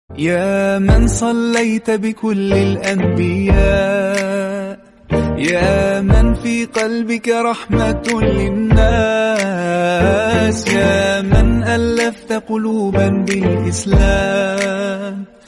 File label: islamic ringtone beautiful naat ringtone